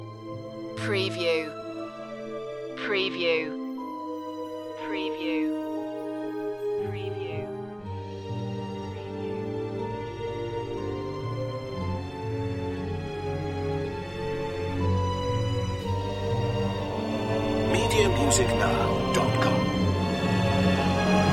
orchestral fantasy loop

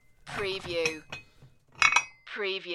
Weights

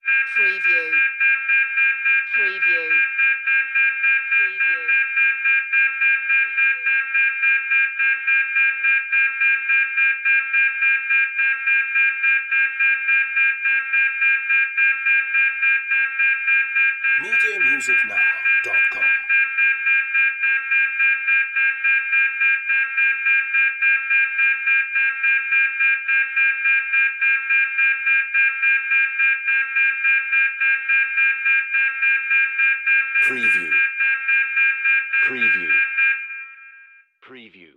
Space Station Alarm

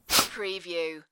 sniff

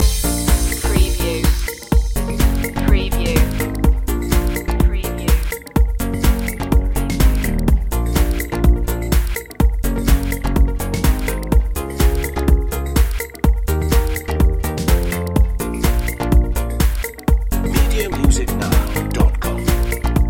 progressive rocks

